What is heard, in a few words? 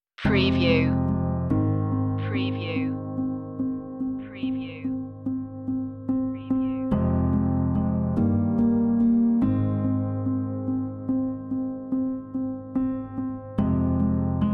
piano only